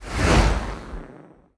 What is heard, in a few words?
sfx transport ship moving out; Stellaris; nemesis